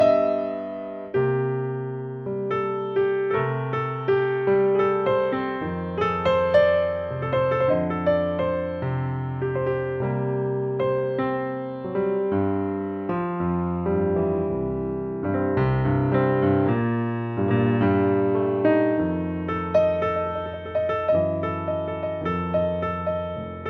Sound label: Drunken Blues